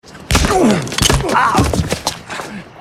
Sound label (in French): Coup de poing